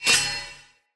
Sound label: Curved Sword Whoosh Accent; Weapons; Player; Swords; Sinecure